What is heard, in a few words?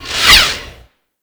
Space Pod Rocket By; Grineer; Vehicles; Warframe